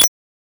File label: pickup; HyperRogue; yendor